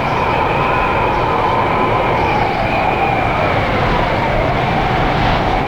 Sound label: move quick loop; LoL; super teemo